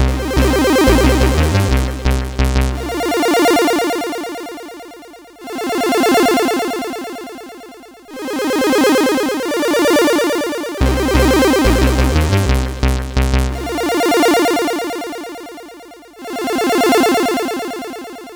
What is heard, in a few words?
Mic Tune, sid CSG8580R5